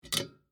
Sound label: Pot Pick Up, Household, From, Wood, Full, Small, Metal, Antique; Pick Up Sound Effects; BlastWave